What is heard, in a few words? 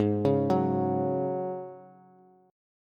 Ab 7th Suspended 4th Ab Bass; Ab7sus4; x,11,11,11; arpeggiated; chordshape; JGuitar